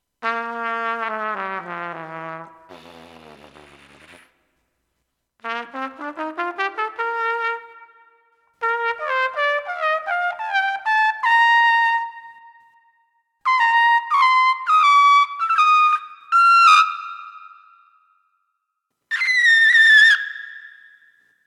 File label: tone